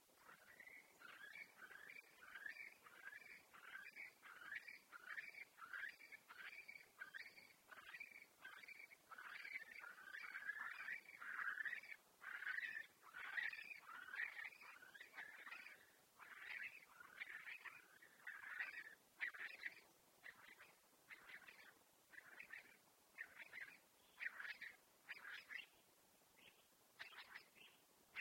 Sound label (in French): Courlis cendré Numenius arquata